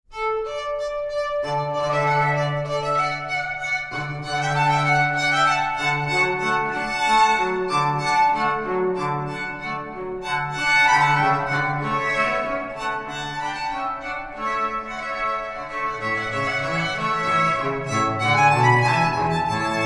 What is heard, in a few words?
String Trio, Classical; La Rejouissance by George Frederick Handel